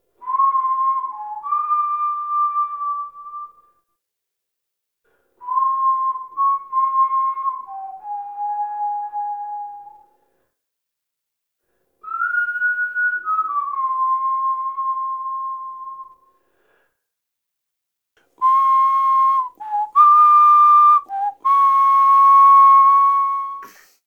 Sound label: Whistle Intro; Cinematics; Zariman; Table For Two